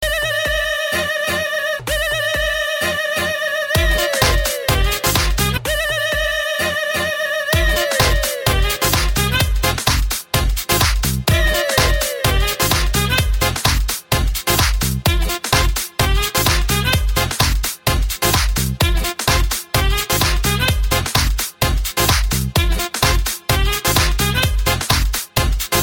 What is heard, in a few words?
Indian Mix